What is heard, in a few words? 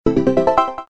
WIN SUPERHUGE; Cash Runner